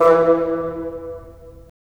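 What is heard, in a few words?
wind bassoon; LA Composer vol. 3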